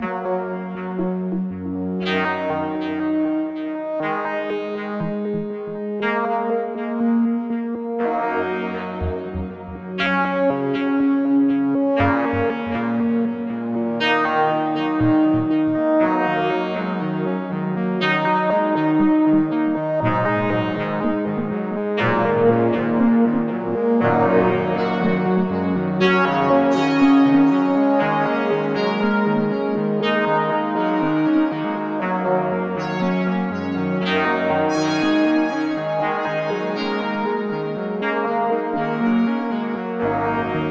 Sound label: Sad Boy II; Drip Watcher; Sludge Life